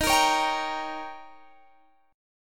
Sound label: D sharp 7th D sharp, guitar